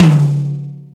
long tail reverb tom one shot d key, Royality free tom drum single hit tuned to the D note